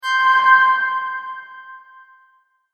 Tap Play Swell; sound design; Swell Sound Effects

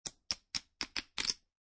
Poker Chips Stacking

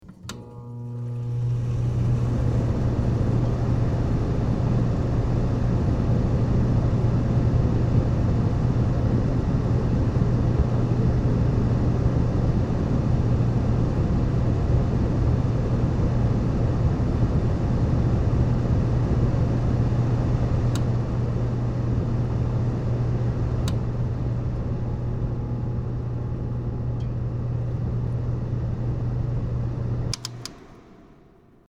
Air Conditioner; Air Conditioner High Setting; Household

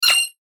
Rollover Fast High Pitch Musical; Mouseover Musical Slide; Multimedia; Blastwave

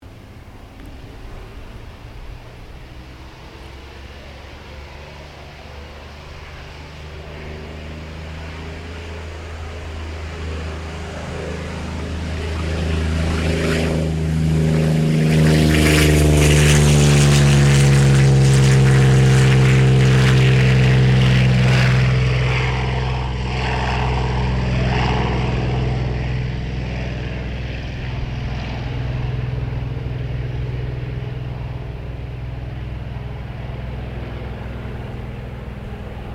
AIRCRAFT P-40 KITTYHAWK TAKEOFF; P-40 Kittyhawk / Warhawk / Tomahawk; Airplanes; Vehicles

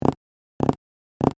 Fingers Tap, Cartoon, On, Surface; BlastWave

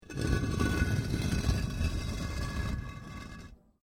Brick Medium Hollow Move

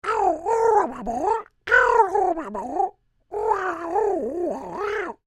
Creature Oodle Bird Speak Phrase Long; Animals

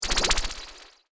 Alien Mind Probe; Alien Sound Effects; science fiction sound effect; Andrew Fleming

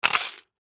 Medicine Bottle Pills Cap Twist Open Push Down; Objects; Foley; Blastwave

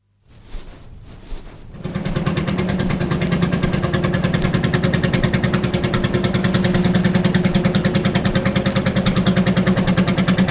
Barge entering lock; Barge entering lock, with rushing water (from 78 disc); Boat; BBC

